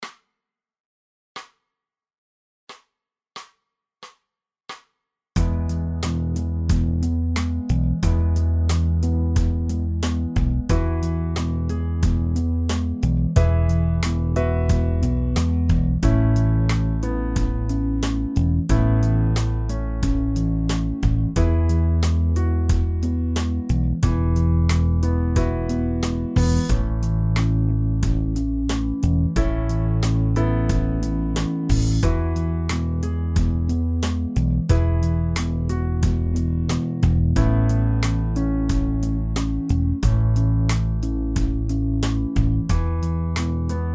acoustic; xmas

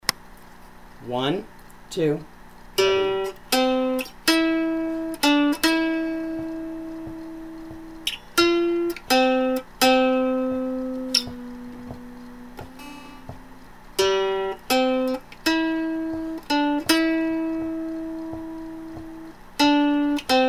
kumbaya harm, dulcimer; xmas

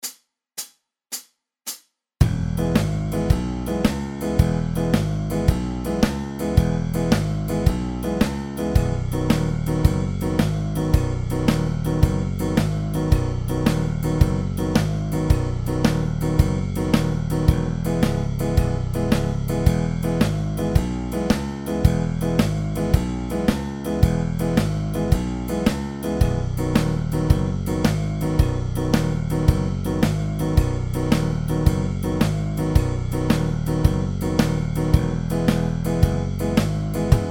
banjo slow; xmas

